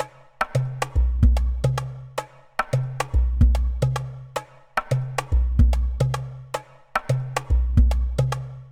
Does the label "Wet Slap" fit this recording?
No